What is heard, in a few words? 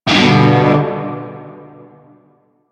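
Shawzin Major Chord H; Music; Warframe; Narmer Shawzin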